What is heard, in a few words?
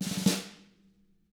SNARE ROLL